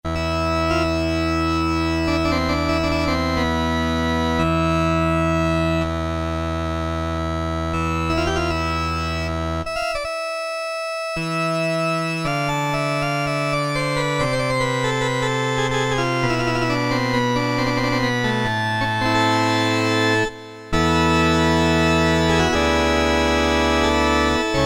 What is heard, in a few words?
pipe organ, Casio MT-68; preset sounds